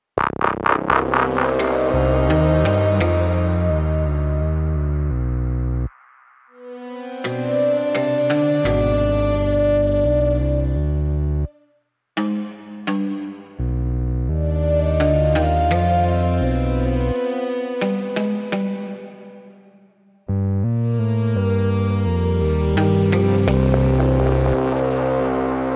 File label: Swamp Base